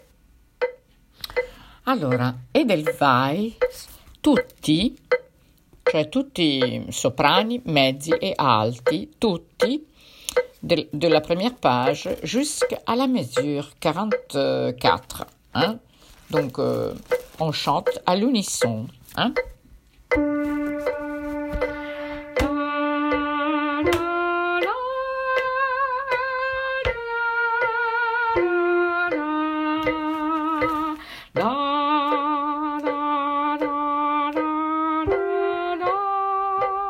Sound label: tutti